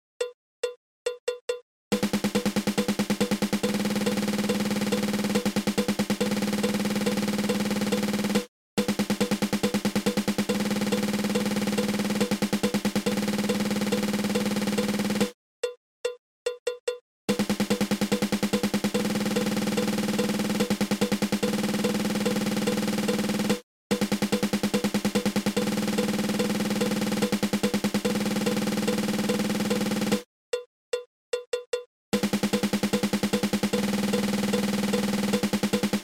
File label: Double Stroke Roll